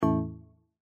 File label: alert